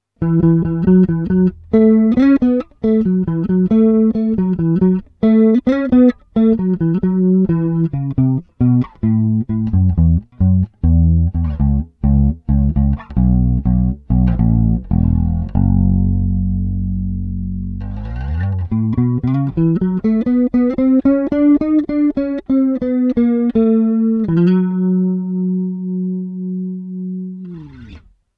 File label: bass ce-2b